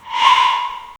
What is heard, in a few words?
violent distorted scream